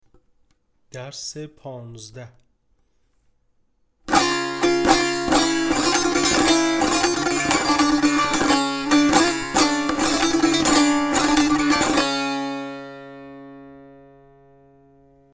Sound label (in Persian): مشق تنبور; تنبور جلد دوم; هفتاد و دو مشق تنبور